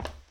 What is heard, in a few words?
glass step; Golden Crayon Sounds